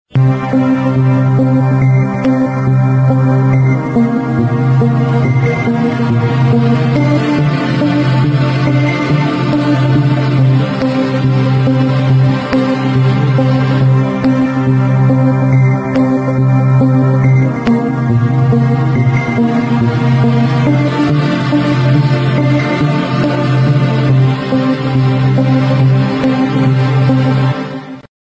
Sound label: Lounge Ringtone